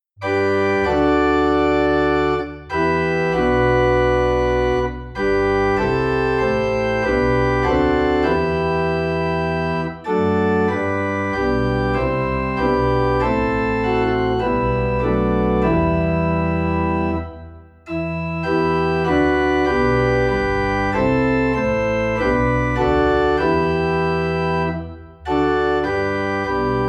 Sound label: Organ in E Minor; christmas; O Come O Come Emmanuel